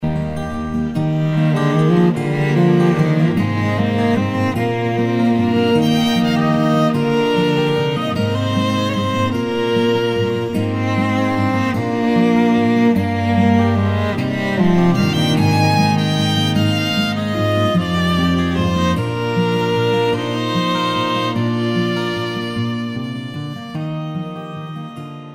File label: instrumental solo